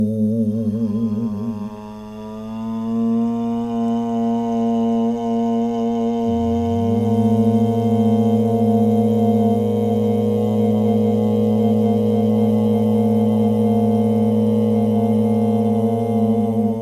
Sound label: trumpetting